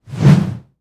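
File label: kazakiri, wind cutting; anemoi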